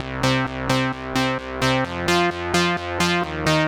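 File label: Synth bass, 130bpm, Nu Dance Electro; Zero-G; Soundclash; Combi Loops; The Teuton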